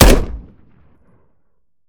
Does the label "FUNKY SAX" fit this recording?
No